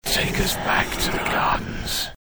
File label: whisper